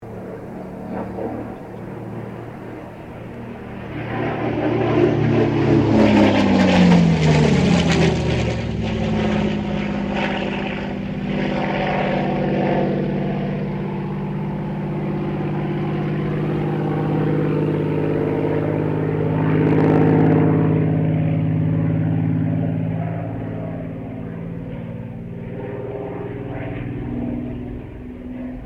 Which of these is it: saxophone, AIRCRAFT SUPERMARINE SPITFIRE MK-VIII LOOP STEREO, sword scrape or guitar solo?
AIRCRAFT SUPERMARINE SPITFIRE MK-VIII LOOP STEREO